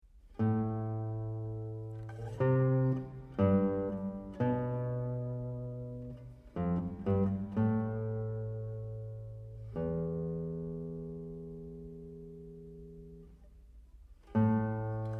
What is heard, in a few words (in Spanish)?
guitarra